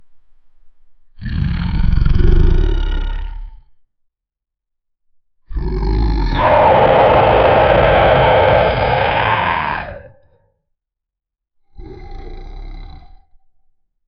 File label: a monster letting out a, booming